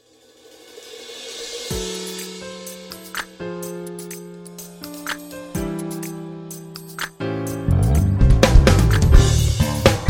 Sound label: instrumental accompaniment